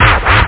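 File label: ratchet; Drum Kit 5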